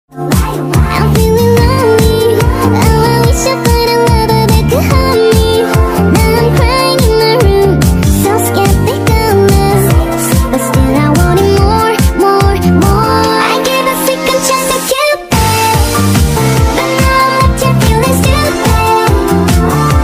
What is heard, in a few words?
Speed Up, english ringtone